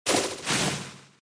charge up

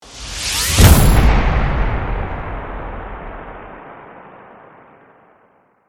Combat Sting

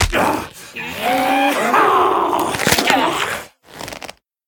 Player Knockout; The Town; (Kill Sequence)

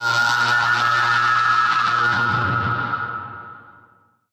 Skill Staff; portal quest android; Dumbledore Skill2

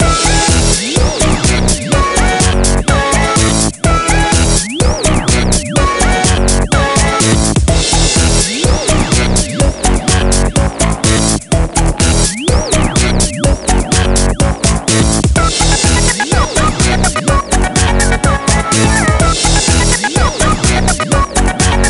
Music Dance Loop; game rip; littlest pet shop garden jungle winter; nds

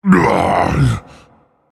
Vocal Colossus Pain Reactions

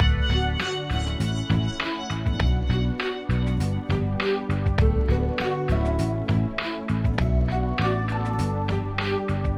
In Game Menu Music; Winx Club; PS2

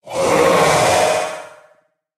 pain

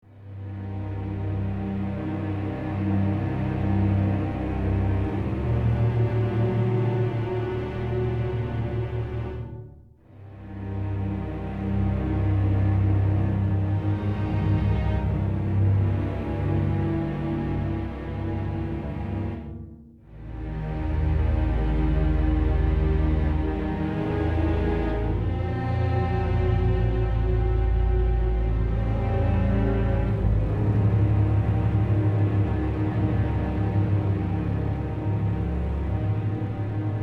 Ominous Loop

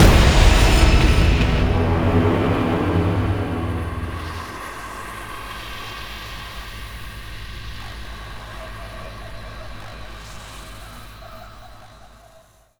Ambient Variant; Prototype; xbox 360; The Door in the Wall

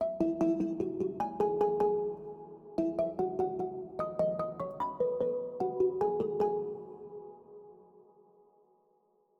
Perc Layer

